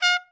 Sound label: TRUMP SRT, TRUMPET; Best Service; Killer Horns